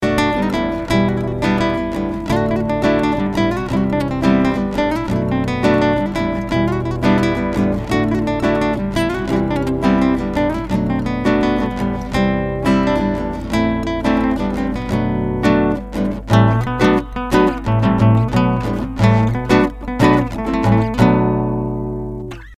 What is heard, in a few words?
Guitar